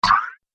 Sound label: Laser X-Bow With Delay, laser gun, sci-fi laser sound effect